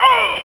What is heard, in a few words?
VOC 160 BPM; Ueberschall; Drum & Bass Flash Trackz